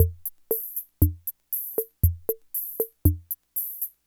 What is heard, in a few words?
Loop, classic machines, rhythm77